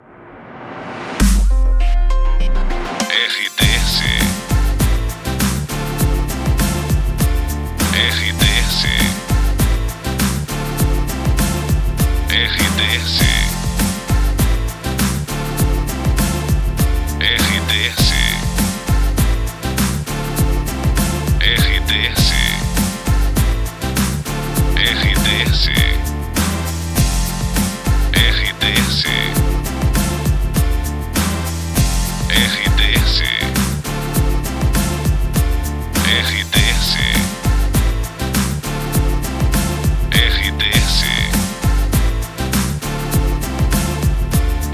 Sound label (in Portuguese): TRILHA PARA LOCUCAO; Aliança Web